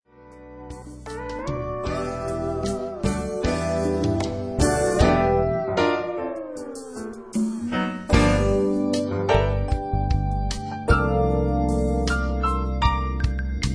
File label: keyboard solos